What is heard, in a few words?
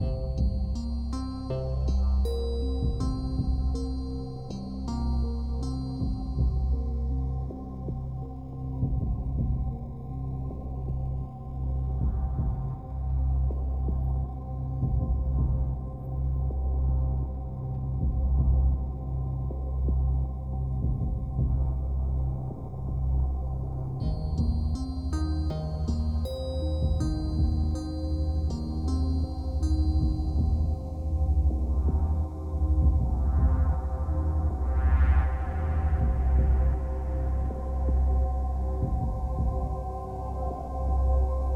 Sanctuary Exploration 8CH 80BPM